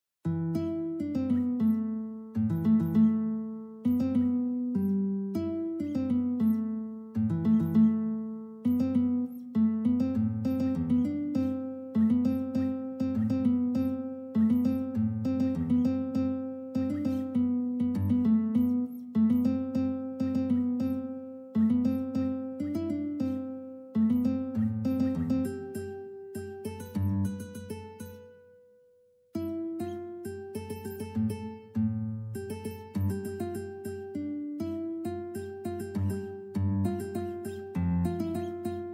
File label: Classic Guitar